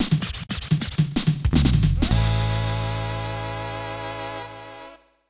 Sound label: Break (Scratched); Adventure Island; SNES